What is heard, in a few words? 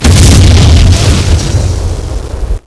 EXPLOSION